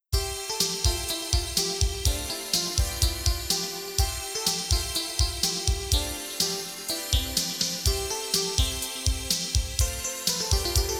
London Bridge is Falling Down; Lemmings; PC-Engine

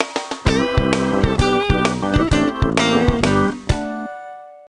Jingle Best; tamagotchi connection corner shop ds; Kuchi

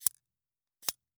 Competition Click

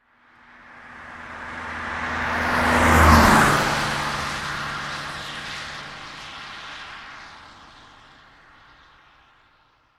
Policecar

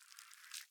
Egg Shake; The Inn

